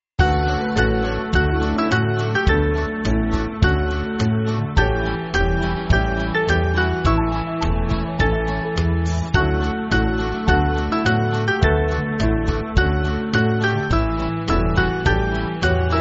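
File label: Band, Free Music Backing Files for Churches; Shall We Gather; Hansom Place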